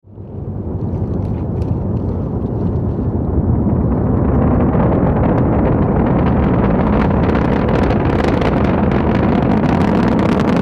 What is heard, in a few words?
space shuttle audio; uncompressed